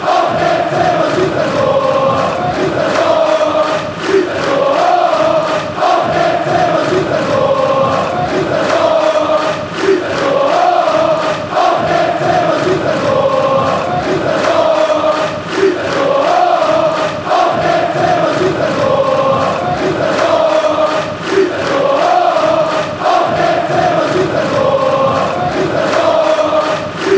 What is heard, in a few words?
zebras score goal